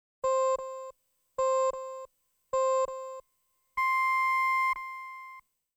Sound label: START SIGNAL